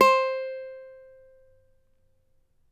CAVAQUINHO C, Guitars; Spectrasonics; Hans Zimmer Guitars VOL-2

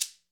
GHANA, African Perc; E-MU Formula 4000 Series Vol. 4; Earth Tones

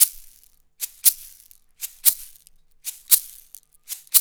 Roots Reggae; Sounds Good

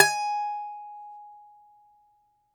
DOMRA, Russian Strings

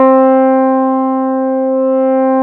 MOOG, SYNTH; AKAI S-Series CD-ROM Sound Library VOL-2

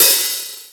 Rock N Roll, E-MU Pro-Cussion

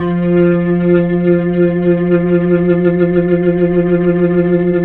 HAMMOND; 3D Sound; 3D Keys VOL-1

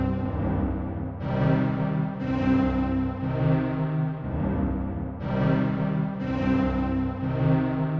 120 Cm string, Trance, String Loops; Trance Explosion Vol1

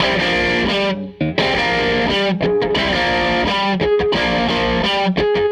Tele Guitar Loop

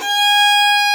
fiddle G#4; InVision Interactive; Lightware VOL-1; Instruments & Percussions

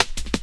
SFX, Drum & Bass, 175 BPM; Ueberschall; Drum & Bass Flash Trackz

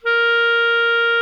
CLARINET A#4, REEDS; Greytsounds VOL-1

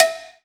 COWBELL B

